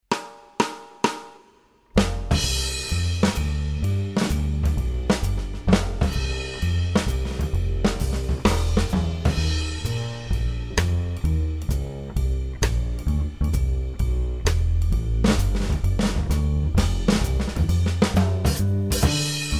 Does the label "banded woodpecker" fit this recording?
No